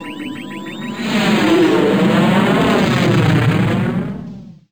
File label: SPACE-FX